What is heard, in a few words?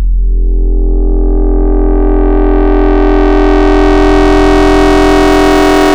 FM Harmonics; Ableton Live 12 Lite; Vector; Sprites